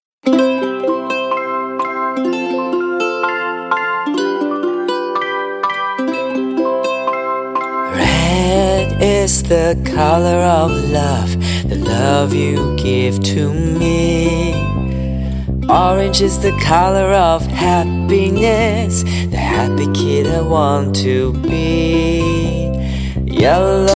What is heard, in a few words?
beautiful singaporean love ringtone